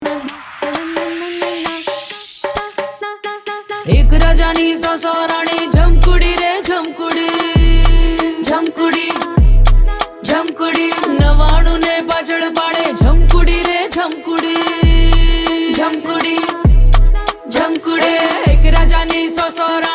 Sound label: song gujarati; jhamkhudi